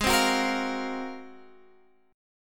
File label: Ab 13th down Guitar Standard; strummed; JGuitar